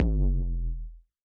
808 BD long, Roland-808 Drum Hits Bass Drum

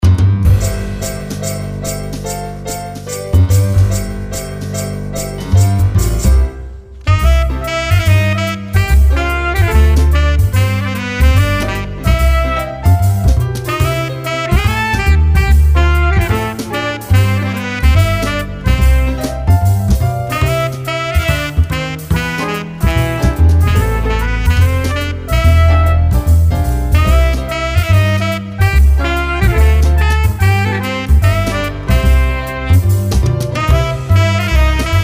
Little Town Jazz, Music background pads

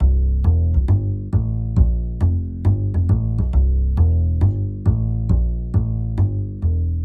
Walking Upright Bass

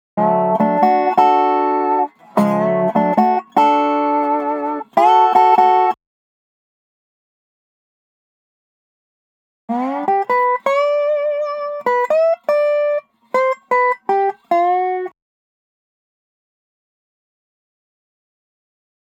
Dobro

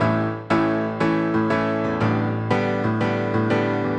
Pop Piano

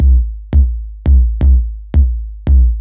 808 Loop BD 85, Roland 808 Drum Loops, 85bpm

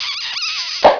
clown kiss, cartoon

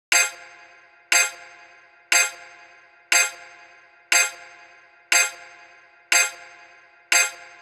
Reggae Stabs F 120bpm

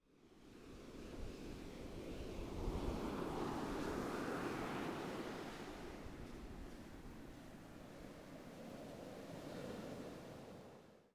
Ocean, ambience

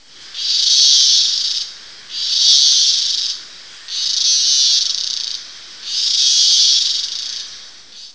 cicada, desert Sounds

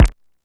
808 BD short, Roland-808 Drum Hits Bass Drum

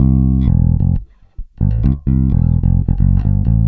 Groovy Electric Bass

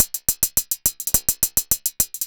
808 Loop Hats 105, Roland 808 Drum Loops, 105bpm

